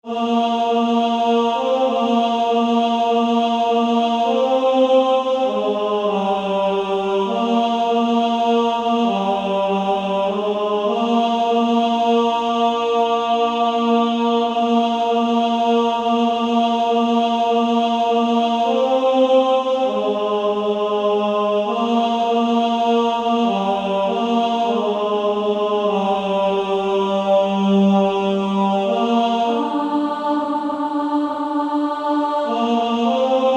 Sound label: Female Choir; 2nd Alto